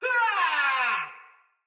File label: Battle Cry Soldier Mace Agent Female; Sinecure Melee